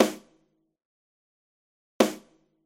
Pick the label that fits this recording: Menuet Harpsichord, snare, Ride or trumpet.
snare